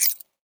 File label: BUTTON